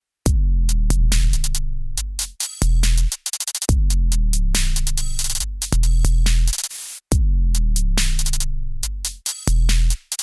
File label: Drumloop; magnetismus 2